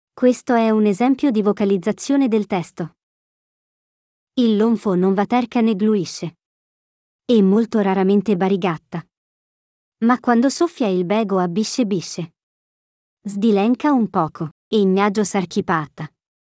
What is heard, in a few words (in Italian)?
vocalizzazione; il lonfo